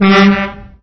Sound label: Truck Horn; 暴力赛车